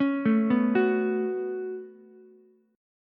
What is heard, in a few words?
Db 7th Suspended 4th Sharp 5th Db down Ukulele; arpeggiated; chord shape; JGuitar